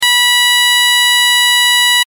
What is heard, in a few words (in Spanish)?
tono